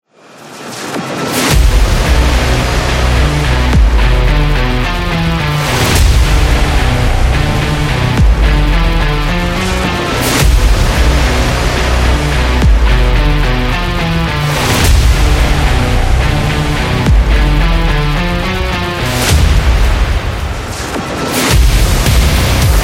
Stadium Hard Rock

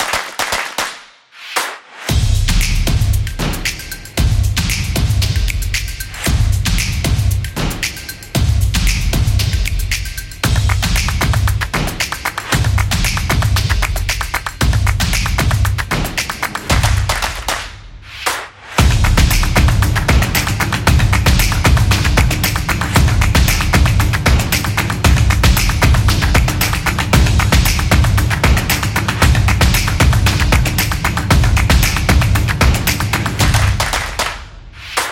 Montage Music; high energy

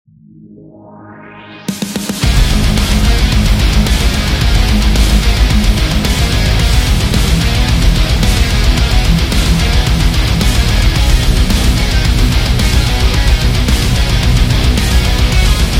Heavy Metal

